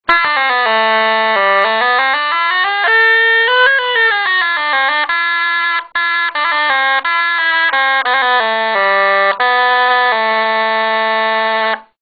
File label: salmaj bariton g, bagpipes